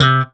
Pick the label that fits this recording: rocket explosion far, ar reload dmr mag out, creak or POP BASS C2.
POP BASS C2